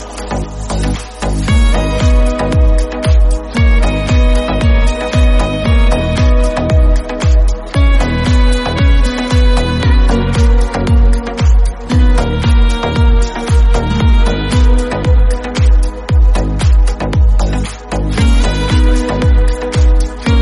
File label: sax instrumental